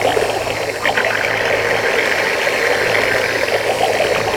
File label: Liquid Wire Loop; Warframe; Corpus